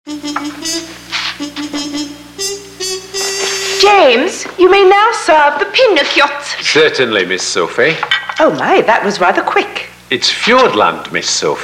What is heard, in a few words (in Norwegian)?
Radio Trompet i start; jordland; PINNEKJOTT